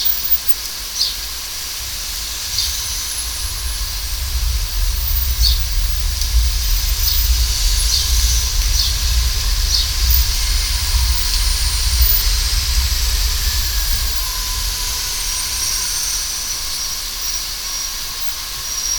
callery pear subway recorded as vibrations in bark